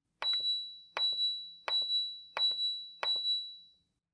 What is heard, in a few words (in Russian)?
zvuk sensora